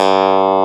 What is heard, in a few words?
CLAV; FUNK